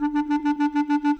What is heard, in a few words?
Clarinets, Orchestral; Sonic Foundry (Sony Creative Software); Orchestral VOL-2; Modern 22